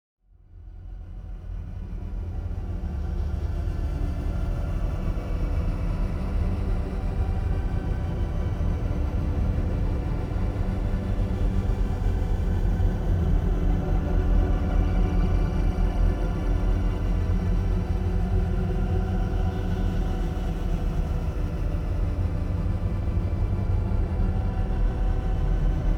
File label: Space, ambience